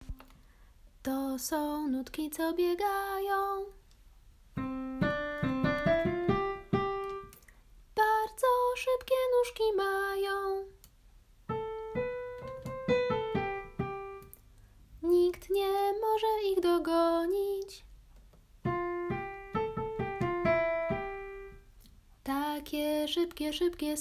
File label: echo